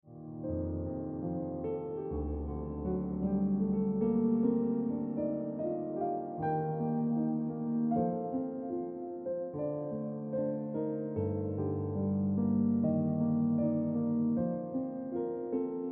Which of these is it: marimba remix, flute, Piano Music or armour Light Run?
Piano Music